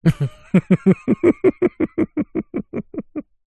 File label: human male middle aged laugh chuckle mouth closed short; zapsplat